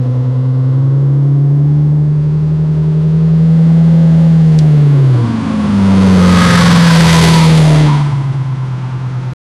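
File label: a powerful sports car launch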